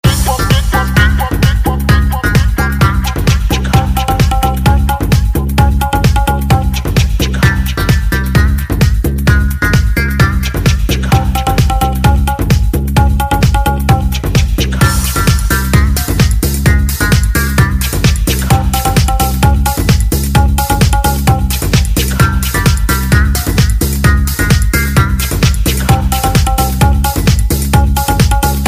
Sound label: electronica ringtone nice